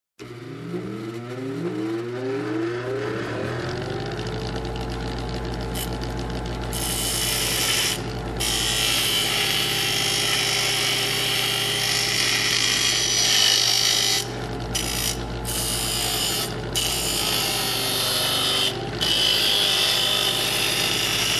Construction

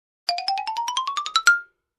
Comedy Glockenspiel

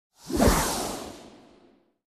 Air Whoosh Sound

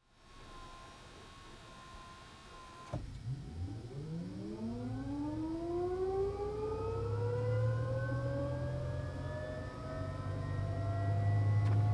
Cockpit Ambience taking off

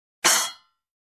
Muted cymbal crash hit

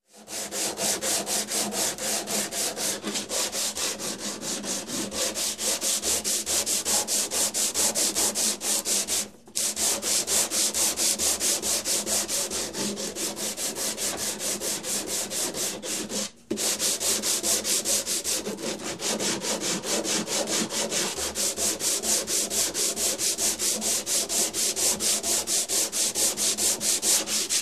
Sand Papering a Wood

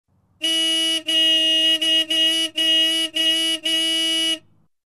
Old uneven car horn

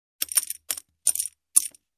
Poker Chips Stacking

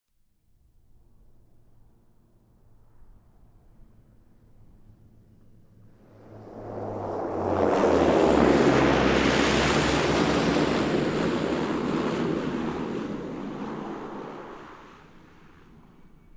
Light Aircraft Ground Rolling Passed by

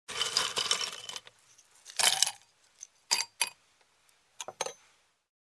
Filling Ice in Glass

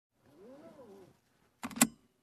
Fasten Seatbelt

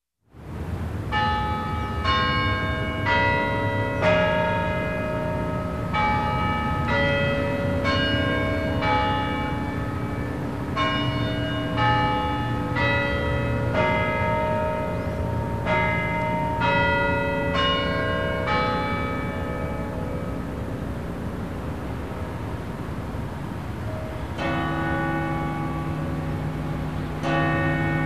Westminster Strike on Tower Clock

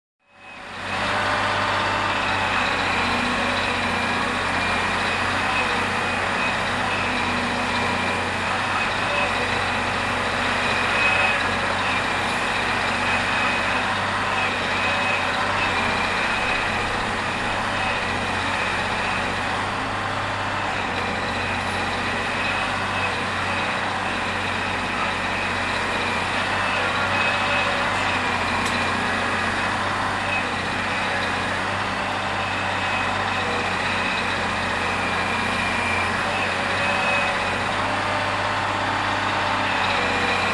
Construction

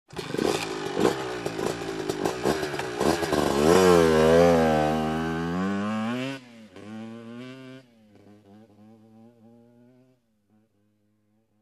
Bike driving away

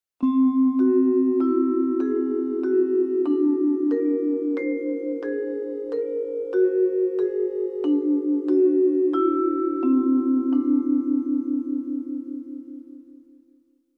Mysterious vibraphone melody

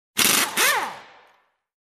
Abrupt Drilling

